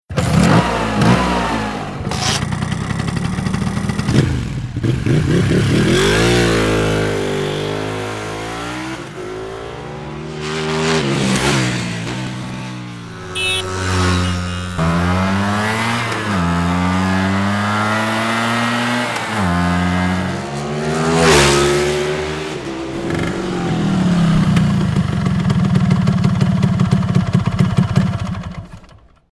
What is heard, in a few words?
Motorcycles; Sound of Italy